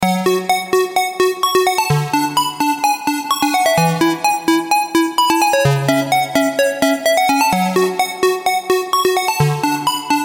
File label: star lead